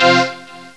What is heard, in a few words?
SAW5TH; Descent II